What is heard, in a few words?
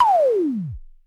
KLONE PTCH NOIZ, Klone Dual-Percussion-Synthesiser; KLONE PERC NW8